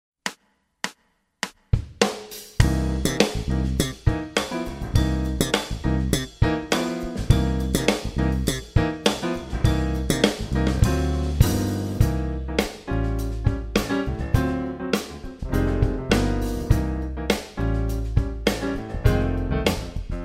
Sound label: brass minus